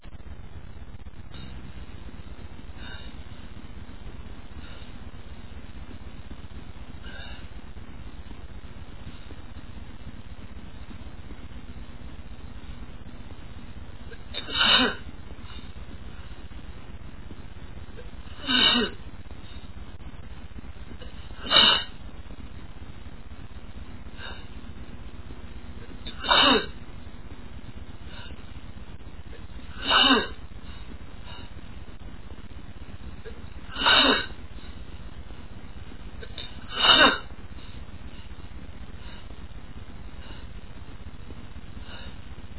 photic, male, achoo

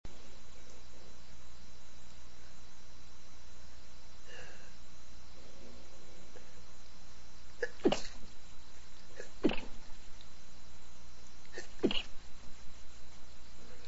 stifles, male, sneezes

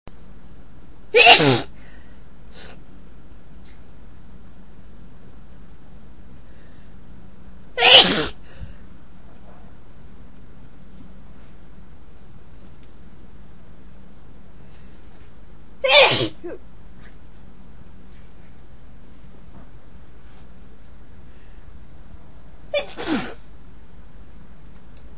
allergies, female

